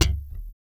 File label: VALLEY FUNK, Bass; Spectrasonics; Bass Legends